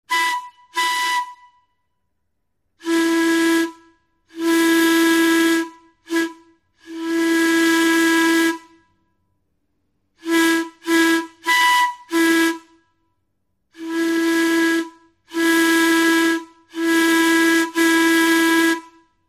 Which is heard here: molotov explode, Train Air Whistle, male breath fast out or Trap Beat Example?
Train Air Whistle